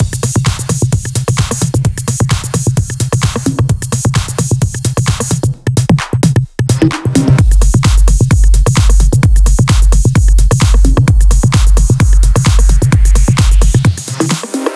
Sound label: 130BPM Mix; Pressure 1 Mix